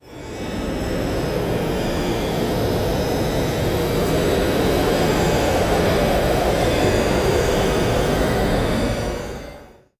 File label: palace ambiance